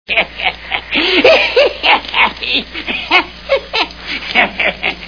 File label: heh heh heh; Monty Python